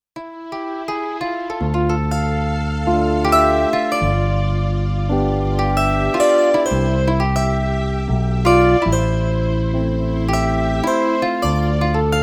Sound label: zither